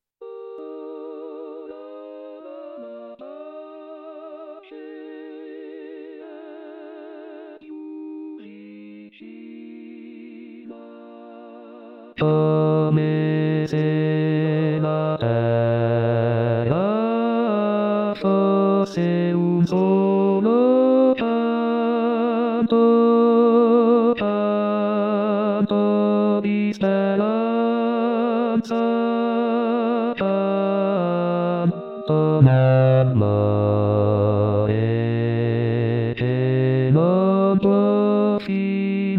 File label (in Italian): Bassi; natale